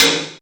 Synth Perc; Dream Zone

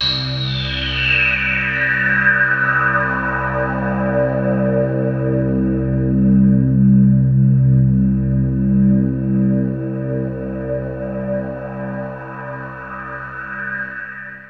Tardis Sweep C; Trance Formation

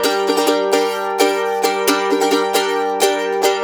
132, Blues Guitar RIFF

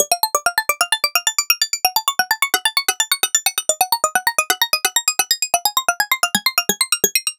Synth Arpeggio, Synths; Eclectic Electronica

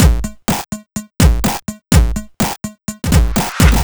No Hats, Drum Loops 125bpm, Nu Dance Electro; Zero-G; Soundclash Nu Dance Electro; Acidized Wavs; Sticky Icky

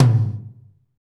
TOM, Roland; Northstar - Drumscapes Roland

